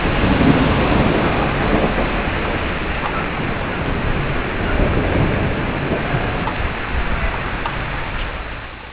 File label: wave rollin